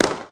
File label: shoot Snap; Mindustry